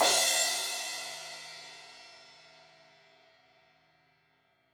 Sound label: Crash 16in edge, Cymbals